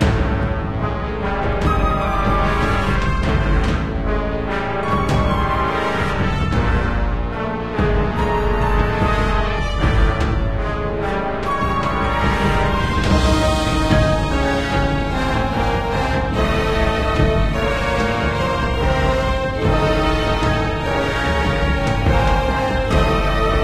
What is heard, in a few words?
tank, music; Left 4 Dead 2; Source Engine; video games